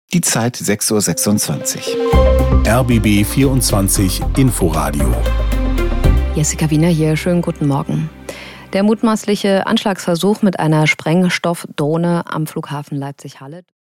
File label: Opener